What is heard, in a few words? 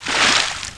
swim; Ferazel's Wand Demo